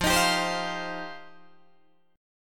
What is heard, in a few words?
F 7th Suspended 2nd F Guitar DADGAD; F7sus2; strummed; 15,18,17,17,15,15